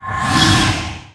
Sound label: ghost attack